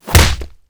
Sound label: flesh; CosmicRage; combat; right hook